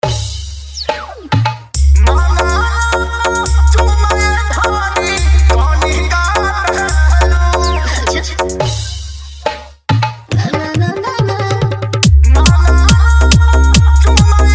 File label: Edm Bass Mix